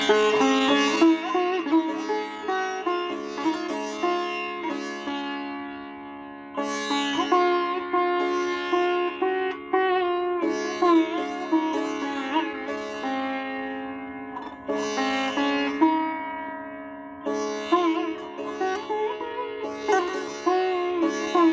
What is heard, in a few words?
Sitar